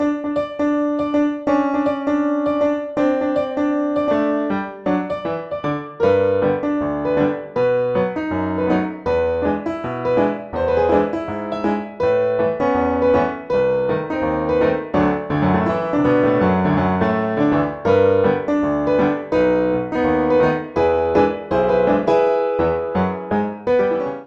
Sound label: three ragtimes; borisova elena